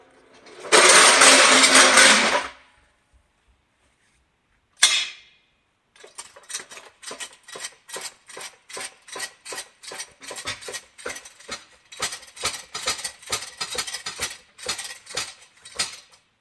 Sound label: hand lift